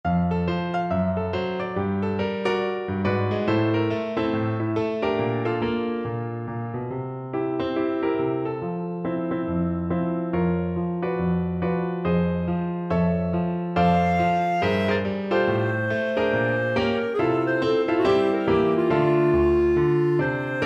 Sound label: jump blues joy to the world, clarinet